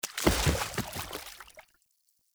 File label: Battle Dog Bodyfall Water, Foley